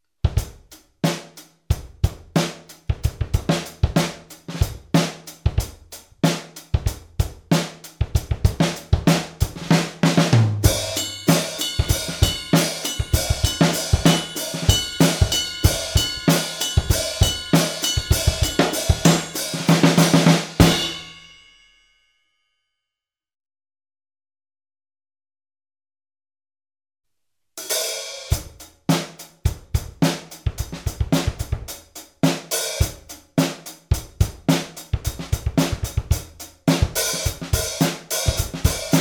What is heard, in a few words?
more kick drum and hi hat please